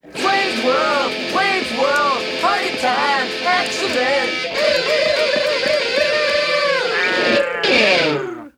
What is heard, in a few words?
Guitar Signoff